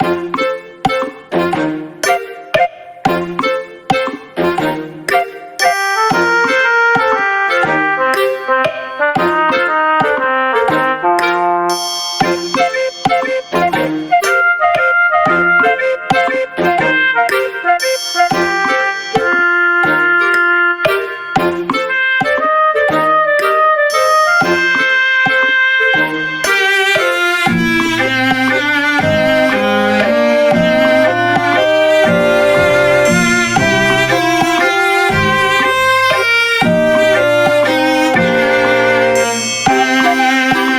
Bgm Fantasy

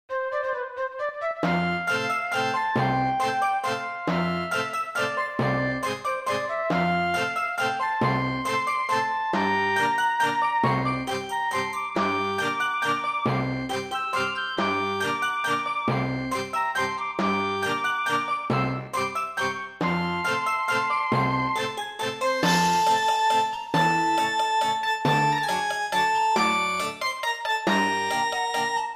snow; Dream Snow

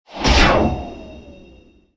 teleport; LeagueOfGods

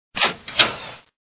door

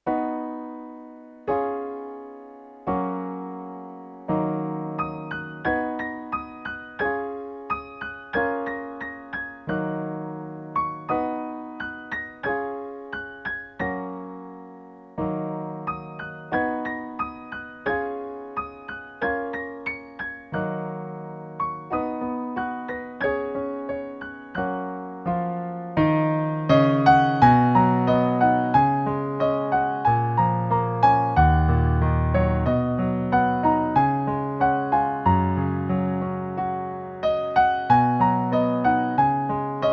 platinum

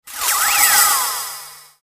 dark

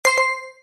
bomb

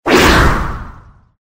door

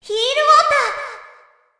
heal Water

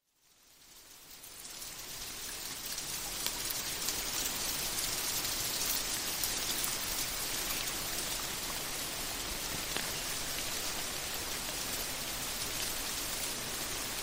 Rain

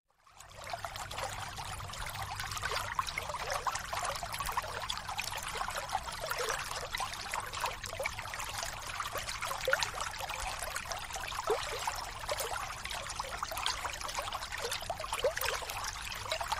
river, White Noise

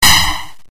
magic

